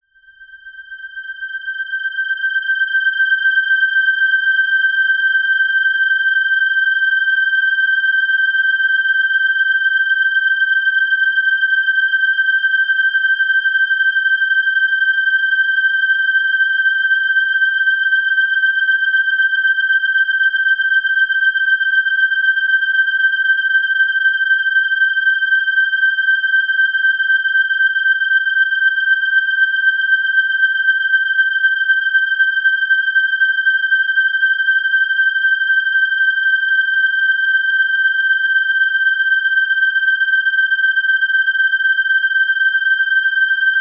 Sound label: high synth sound